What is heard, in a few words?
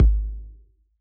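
bass drum analog; AnlgKit1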